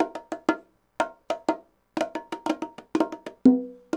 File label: bongos, latin, 120bpm; Sound Effect Library